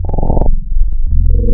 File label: MOOG TABLE, wavetables, Classic Synths